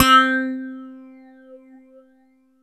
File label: JV phase, JV-1080; Syntec; Wall of Sounds